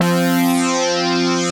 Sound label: Power of 7, Synth Pad; Ableton; Creative Extensions; Poli